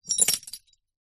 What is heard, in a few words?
household wind chimes metal set down on ground; zapsplat